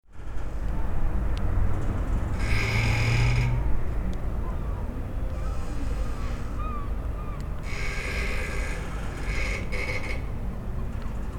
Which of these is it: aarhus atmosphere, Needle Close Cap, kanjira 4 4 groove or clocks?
aarhus atmosphere